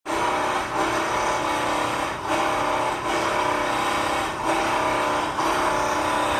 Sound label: rivetter